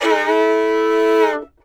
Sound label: FIDDLE FX; Sampleheads; Whole Lotta Country 1 & 2